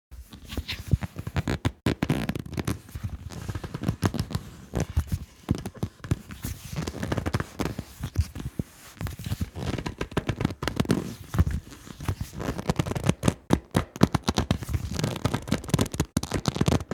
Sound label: Wet Stretch leather